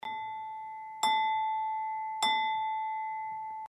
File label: Harmony Flower A5; Indigo